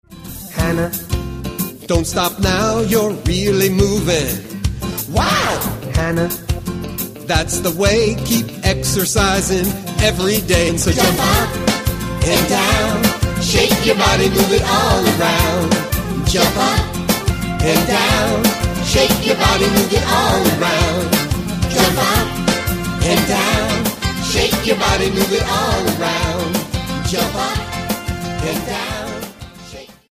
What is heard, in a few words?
jump up and down